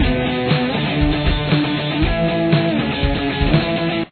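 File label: chorus guitar, the 2nd guitar plays octaves; drop D tuning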